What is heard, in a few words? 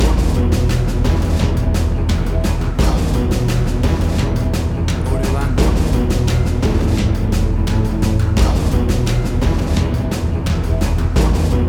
Big Scary Action Trailer Strings